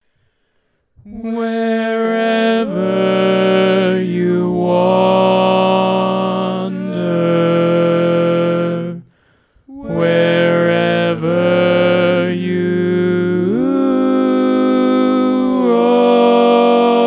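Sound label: Baritone, barbershop tags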